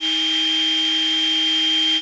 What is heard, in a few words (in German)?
emotor